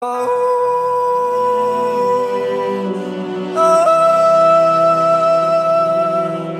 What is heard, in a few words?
awoooo awooo